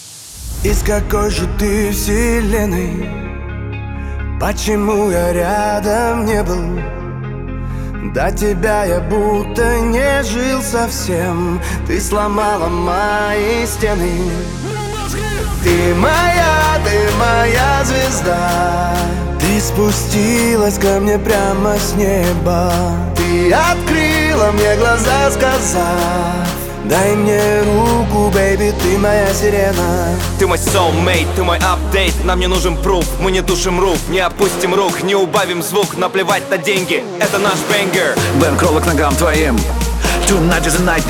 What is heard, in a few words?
sirena